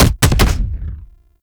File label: Scaldra Pistol Alt Fire Punch